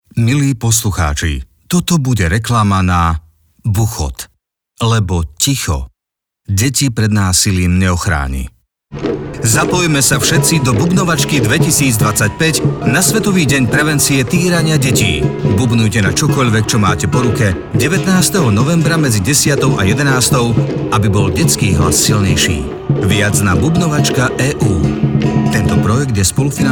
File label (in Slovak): bubnovačka